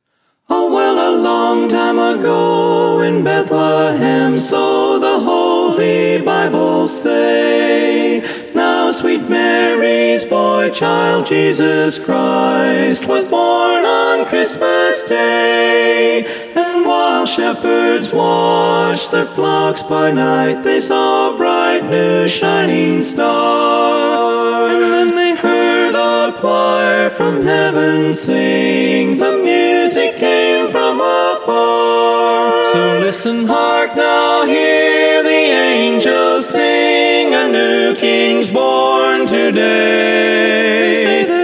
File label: acappella